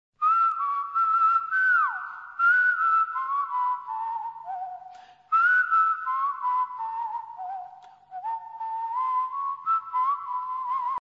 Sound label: Villain Whistle; Tamil; Movie